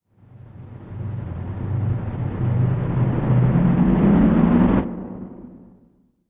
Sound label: Wormhole